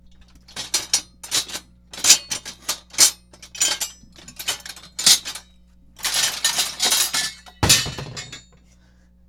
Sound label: Metal Mess